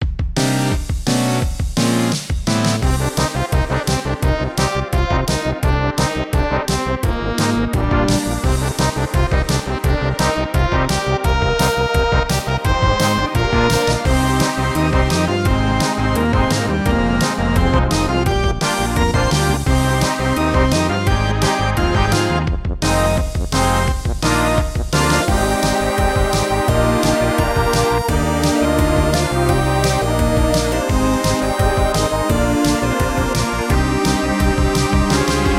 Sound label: story combat